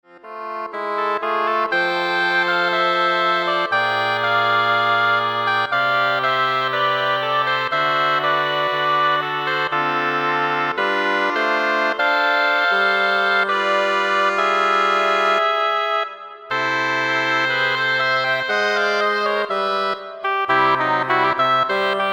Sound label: Double Reed Ensemble